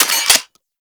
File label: Heavy Shotgun Mech C; Warframe; Tenno